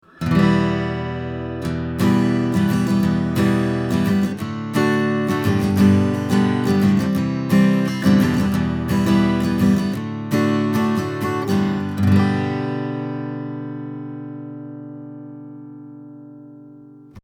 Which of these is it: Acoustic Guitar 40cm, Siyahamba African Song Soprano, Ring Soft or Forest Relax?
Acoustic Guitar 40cm